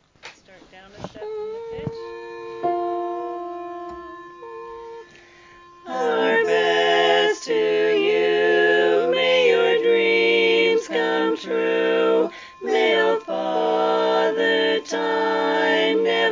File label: our best tenor